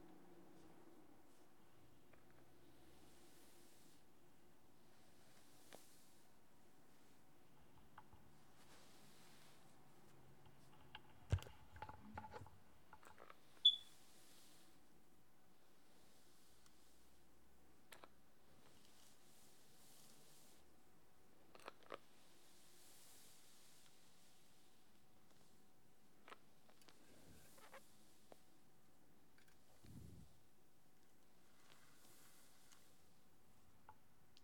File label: Hair Brush